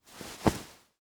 Suit Coat Adjust, Foley, Clothes, Suit, Coat, Adjust, Movement, Straighten, Arm, Single; Dressing Sound Effects; BlastWave